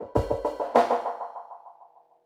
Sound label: drbreak, drumbreaks; Masterbits; Vinyl Attack